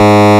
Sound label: Sawtooth G#1; Ableton Foundation FreePacks; Foundation Glitch Toys & LoFi